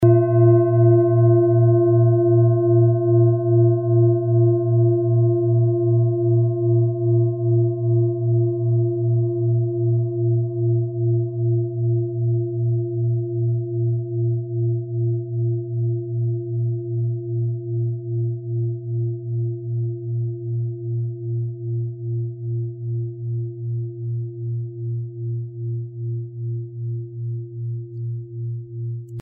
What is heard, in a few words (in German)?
klangschale